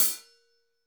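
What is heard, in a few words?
hi hat; HH-Kits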